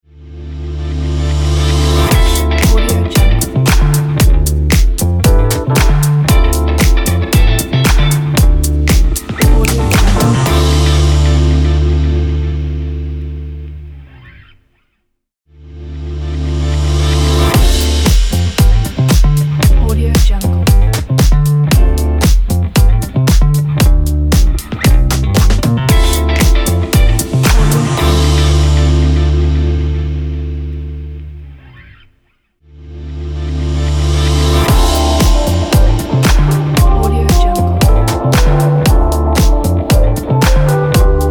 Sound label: fashion disco funk intro